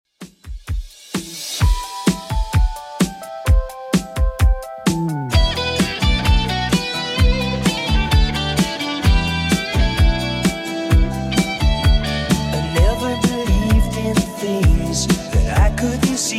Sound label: 80s Redrum 129bpm